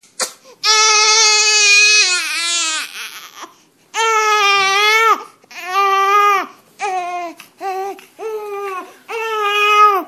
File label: crying 3 weeks